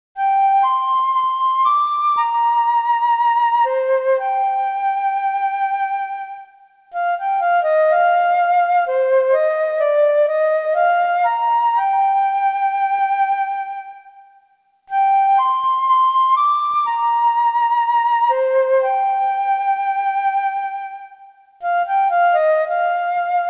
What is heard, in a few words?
Flute